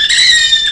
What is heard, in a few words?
rooster; Amiga Soundtracker Sample Packs